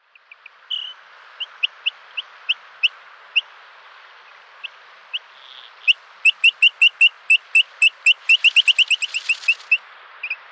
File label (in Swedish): Fjallpipare, fagelljud